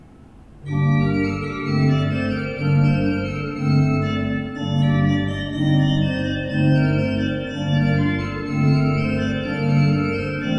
Beckerath, gebrauchtorgel

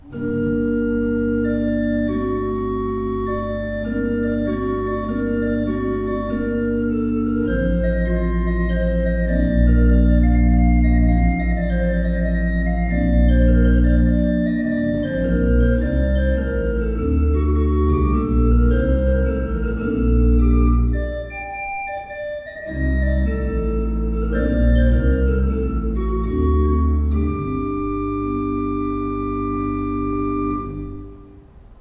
Bosch Raupach, gebrauchtorgel

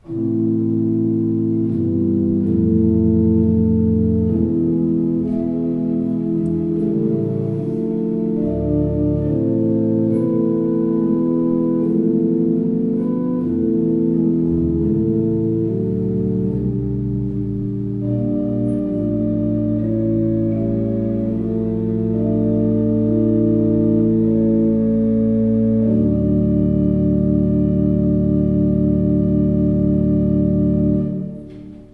Euler II P, Orgel

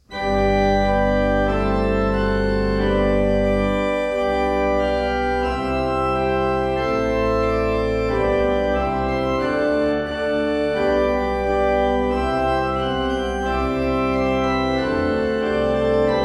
Walcker, gebrauchtorgel

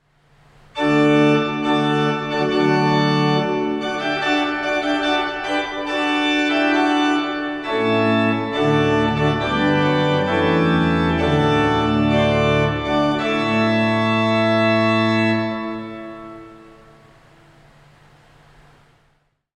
Orgel, pfeifenorgeln; HW-Prinzipal 8'+Oktave 4'+Mixtur

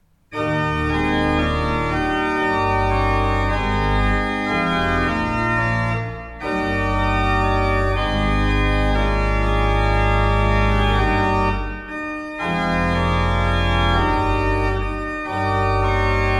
Breil, gebrauchtorgel

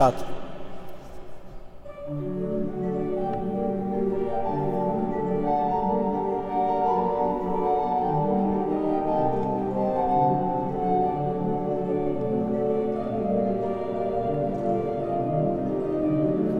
Orgel, pfeifenorgeln; Klais

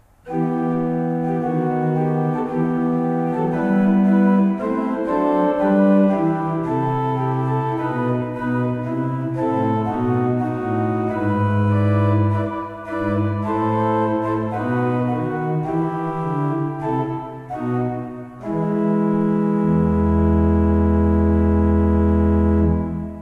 Fleiter 19 II P, orgel

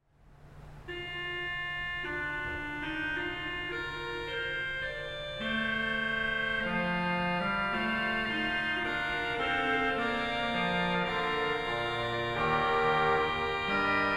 Orgel, pfeifenorgeln; RP-Krummhorn 8'

